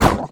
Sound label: blues piece death; Crypt of the NecroDancer